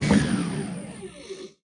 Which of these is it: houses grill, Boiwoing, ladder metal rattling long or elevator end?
elevator end